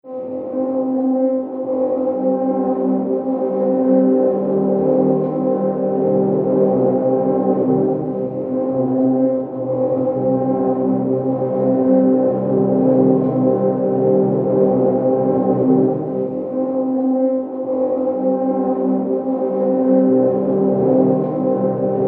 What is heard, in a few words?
Horn Flutter Swarm